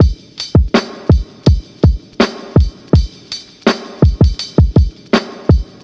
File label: hitting the cymbal stands, boombap drum loop; Drum loops